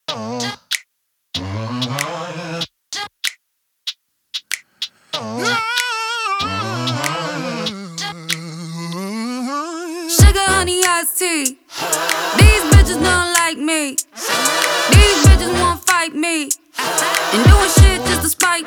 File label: Hip Hop